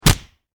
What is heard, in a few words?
Impact Punch Face Fist Cuff; Punches and Hits; Combat